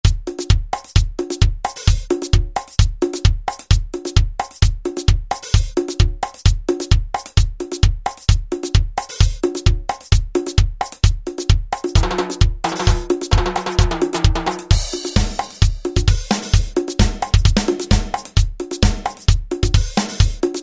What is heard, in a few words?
Latin, Alesis SR-16; Preset Patterns